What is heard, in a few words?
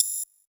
UI Boost Aim; we are the dwarves gamerip 2016